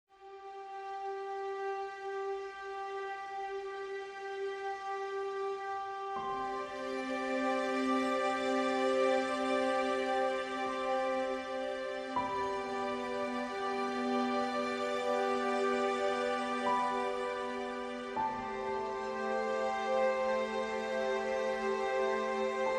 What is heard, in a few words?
Soothing Waves No Percussion